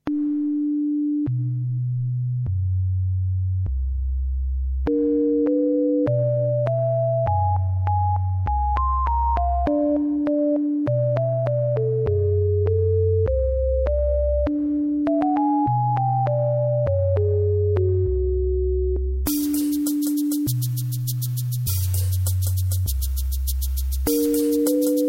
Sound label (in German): sinus